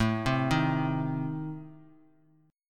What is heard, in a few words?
A Diminished, down Bass Standard; Adim arpeggiated; JGuitar